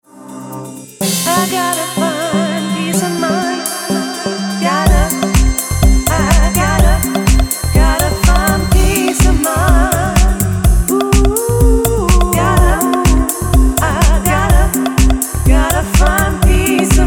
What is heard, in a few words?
HOUSE